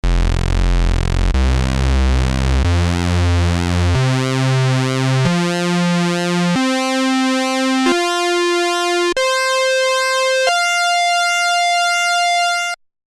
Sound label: JP 08 pulse; Roland Boutique Jupiter JP-08 Synthesizer